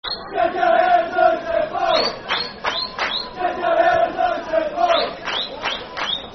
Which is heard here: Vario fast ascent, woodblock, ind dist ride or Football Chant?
Football Chant